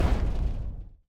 block Explode Alt; explosions; Mindustry

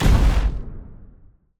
explosion Dull; explosions; Mindustry

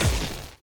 shock; Mindustry

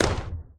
shoot snap; Mindustry

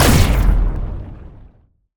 artillery shock explosion big; Mindustry

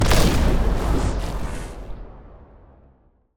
explosion Reactor; explosions; Mindustry

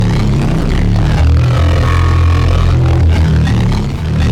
beam Plasma; beams; Mindustry

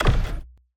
unit Create; Mindustry

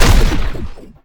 beam plasma fire; Mindustry

